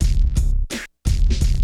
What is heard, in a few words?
LOOP 86, CHRONIC LOOP, Hip Hop; InVision Interactive; 40 Oz Phat Slammin